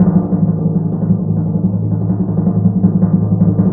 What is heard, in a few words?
TMP A#, Synclavier Percussion